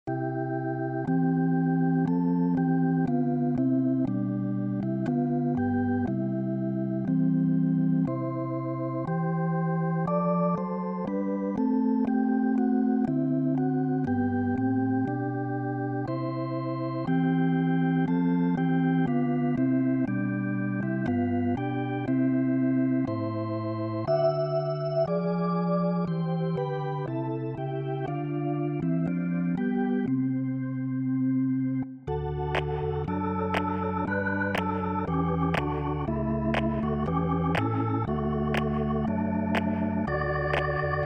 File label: Rotary Fun